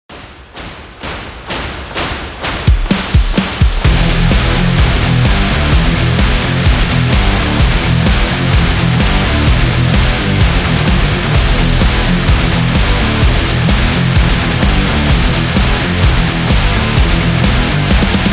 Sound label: This Metal